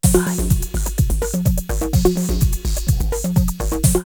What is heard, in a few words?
drumloops Rave 126; X-Static Goldmine; 90 s Sample CDs